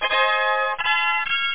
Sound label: tadaa